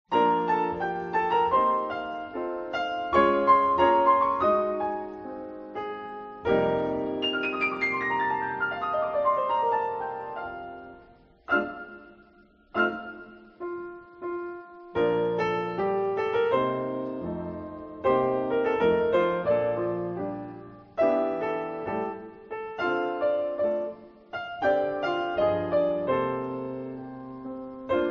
A Happy Song piano temp track

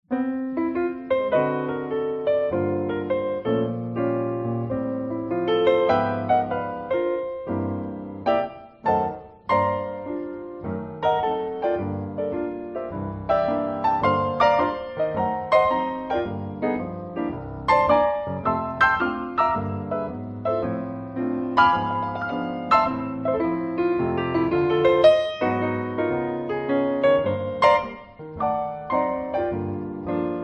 piano

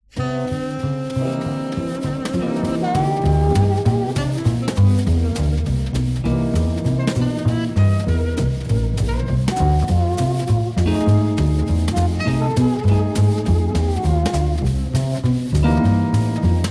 Unused Jazz

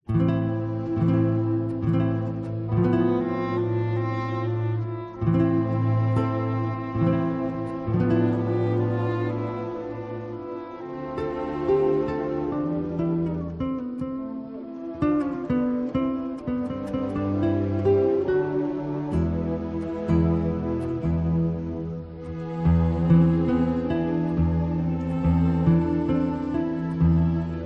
Adagietto for Guitar and Orchestra

